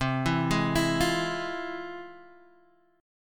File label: F Minor Major 7th C, guitar chord